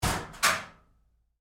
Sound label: Door Locker Open Roomy Lite, Metal Door; Door,Locker,PK1; Metal Doors